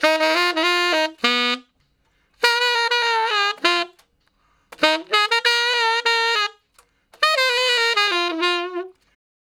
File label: Ten Sax Straight (F), R&B; Crimson Blue and Fabulous Horncraft 4 RnB; Solos